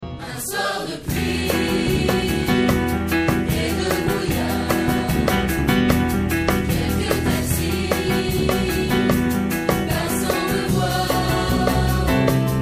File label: blues